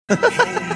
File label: giggling